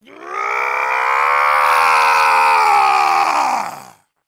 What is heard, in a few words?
raahhh